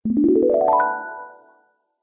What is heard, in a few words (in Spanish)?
magia